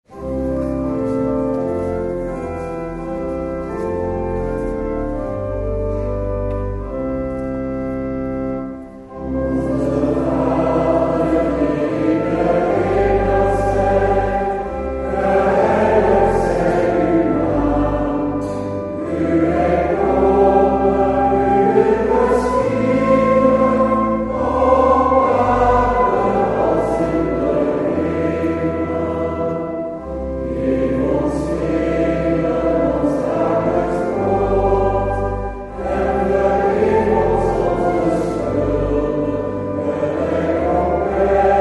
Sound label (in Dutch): Onze Vader; 141005 BR Parochiefeest